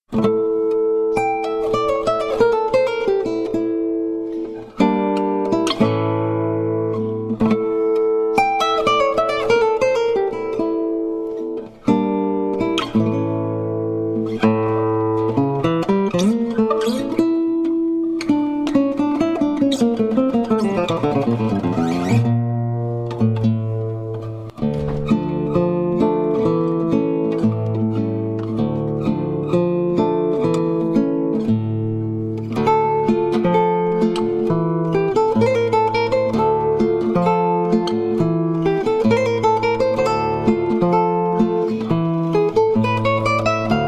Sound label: Classical Spanish Guitarist; Capricho Arabe